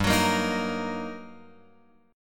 G Minor Major 7th Sharp 5th G; GmM7#5; {3 X 4 3 4 6} strummed

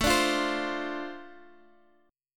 D-Minor 7th A, chord

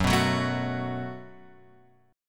F sharp Minor Double Flat 5th F sharp; F#mbb5 Chord {2 2 4 2 X 2} strummed

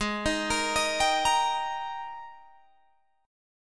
Ab Major 7th Suspended 2nd Ab Guitar Open G

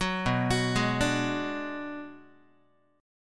B Minor 6th B, Guitar; Bm6 arpeggiated; 9,7,9,6,6,x; JGuitar

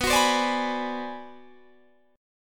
B Minor 6th Flat 9th B

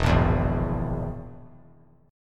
E Major Flat 5th; strummed; JGuitar

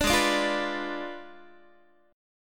G sharp Diminished 7th, Guitar; strummed; 17,16,17,15,15,x; 4A,4E,5A,5D,5G#,6C#

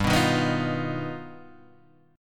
G Augmented 7th, Guitar Half Step Down

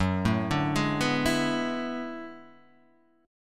B Minor 13th Gb

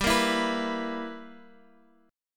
B Major 7th Suspended 4th Sharp 5th G

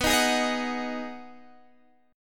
B 6th B down Guitar Open G

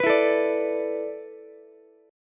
A Suspended 2nd Suspended 4th, down, Ukulele; Asus2sus4 strummed; JGuitar

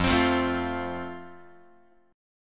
F Major, Guitar; strummed

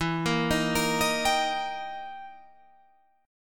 E Minor Major 7th Flat 5th, Guitar, Drop D; chord shape

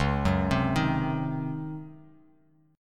Db Major 7th Suspended 2nd Suspended 4th, Db; 9,9,10,8; DbM7sus2sus4 arpeggiated; JGuitar

